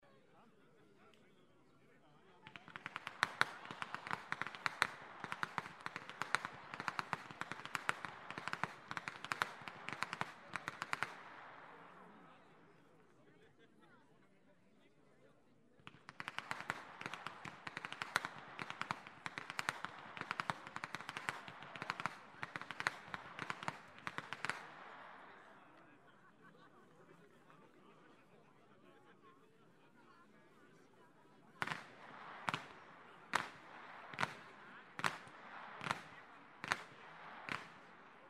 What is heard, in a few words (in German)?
schnalzen, flachgau; MAXGLAN IV